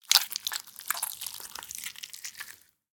gore slime creature meat blood long movement various; sonniss gdc